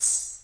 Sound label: gungru, African and Eastern Percussion